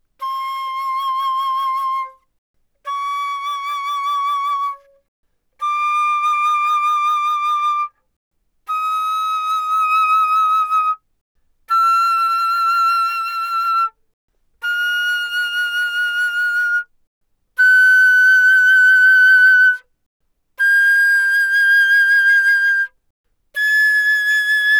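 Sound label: Flute vibrato ff C6 B6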